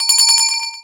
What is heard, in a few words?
bell; QUEST SANKA BELL; MonsterHunter